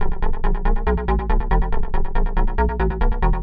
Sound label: 140 BPM, Assorted Synth Loops; Electronic Garden